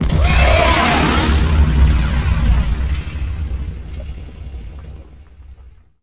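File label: combat explode tie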